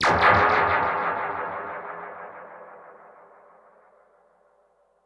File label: Perc Hit, dub percussion